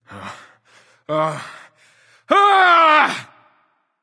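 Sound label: nonverbal fear; smite; voicepack; merlin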